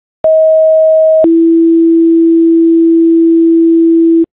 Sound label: page, tones